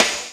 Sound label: Snare, Ensoniq ASR-X